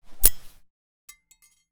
Nade Over Cook, Grenade; zps; Weapons; Explosives

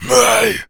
Attack, Zombies; zps; Z Carrier Speech

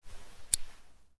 Grenade Draw; zps; Weapons; Explosives

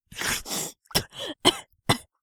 female air rough; zps; Humans

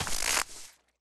snow footsteps; zps; Foley

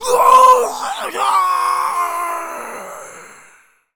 Male Berserk, Zombies; zps; Male1

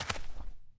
dirt, Footsteps; zps; Foley

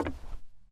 woodpanel, Footsteps; zps; Foley

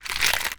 Ammo Pickup; zps

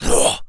Jump, Zombies; zps; Carrier

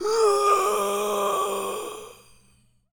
Male Taunt, Zombies; zps; Male1

